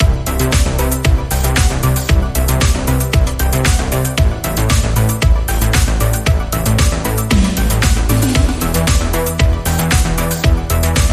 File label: Space Synths, Rhythm